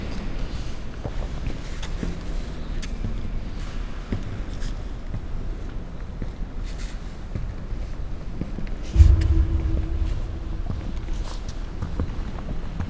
séta; bagrati

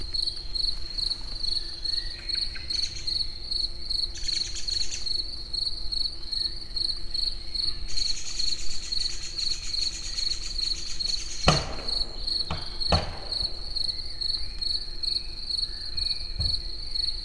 munkazaj és bagoly is, tücsök; allathangok; termeszetben; egerturistahaz

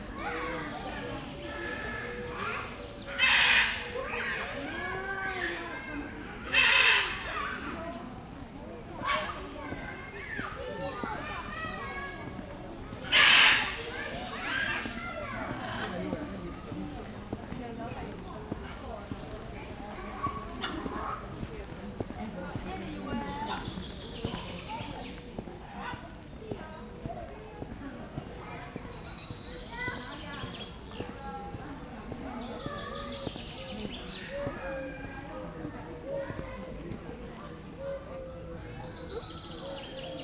ara papagaj; ara papagajnal; szegedi zoo 2011; gyermeknap gyermekszaj

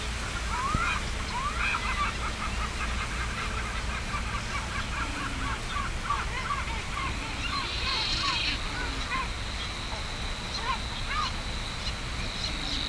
sárgalábú sirály halastavak; hortobágyi nemzeti park 2013

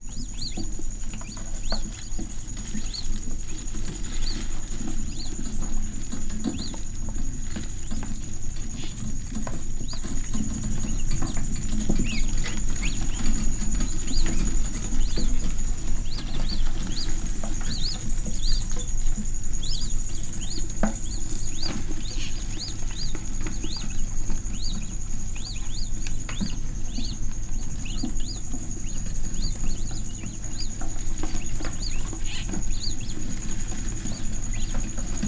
zsibvásár, csupasztúrkáló; jászberényi zoo 2015

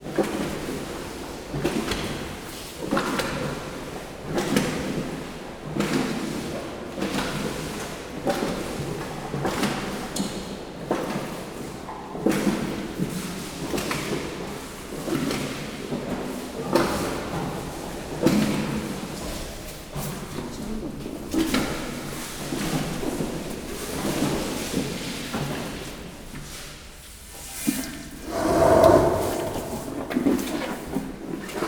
szusszantás a végére, nílusi víziló; pecsi zoo 2017

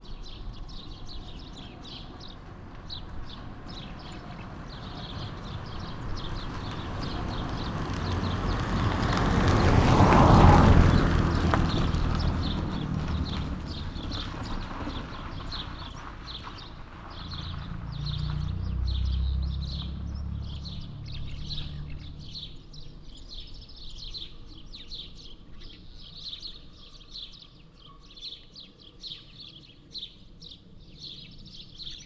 verebektől hangos utca jobbról balra, autó személygépkocsi; Szőlősardó

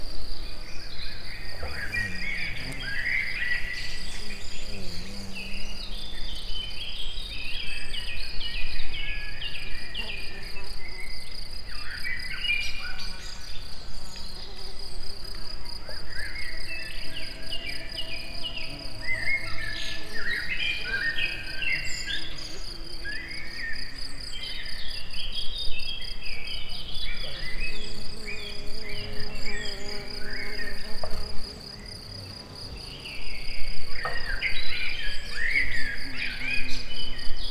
hangosabb 2 több halk hangos környezet, rovarok; termeszetben

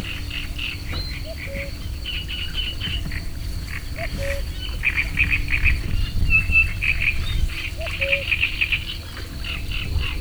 cserregő nádiposzáta kakukk; termeszetben

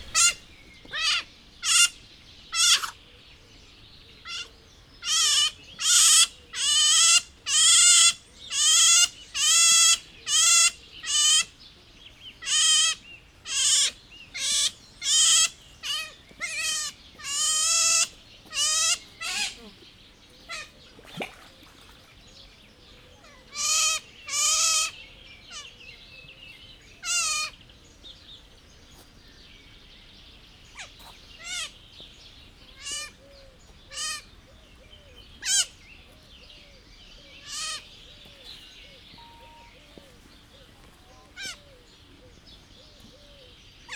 aki remindig lehet számítani, ázsiai kiskarmú vidra; jászberényi zoo 2015

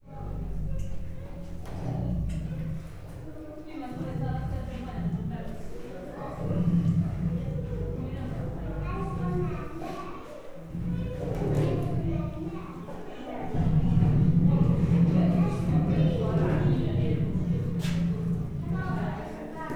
látogatók előtt hörgő, bengáli tigris; miskolci zoo 2009

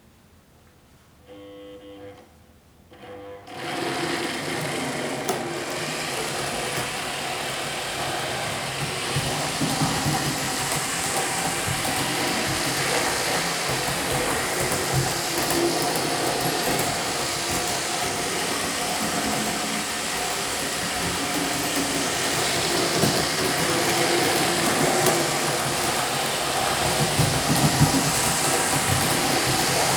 a felborulás pillanatáig, modellvasút h0; perkupa

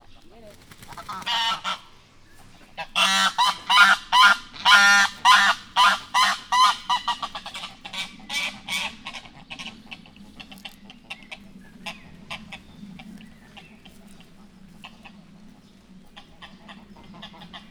kialto lud, nyari lud; miskolci zoo 2018

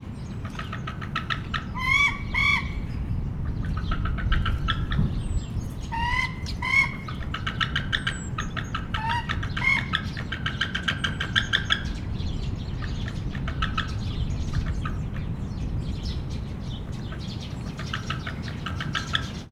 más madár is, szeles idő, rétisas; Hortobágyi Nemzeti Park

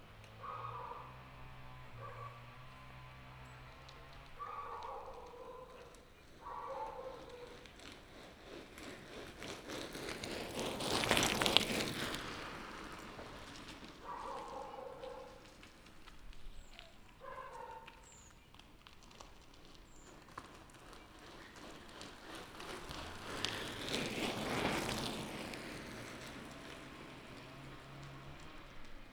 negyszeri elrobogas, kerekpar tura; varboc

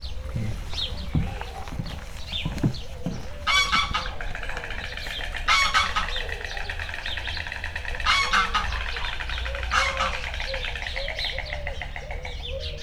nyári lúd, fehér gólya, halk fekete holló; Hortobágyi Nemzeti Park 2013

